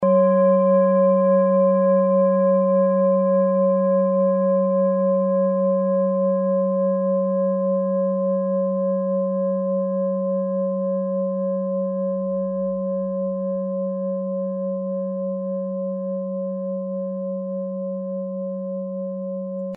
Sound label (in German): klangschale ladakh